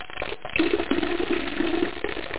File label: PISS